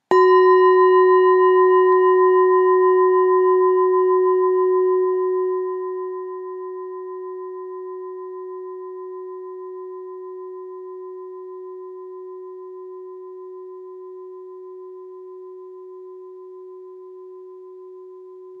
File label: Singing Bowl